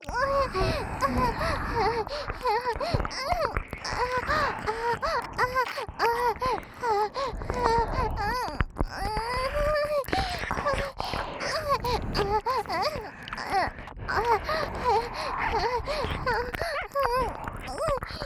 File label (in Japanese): 本番喘ぎ ＋ 両耳舐め; おまけループ（オナサポ）; 3WAYループ; SE無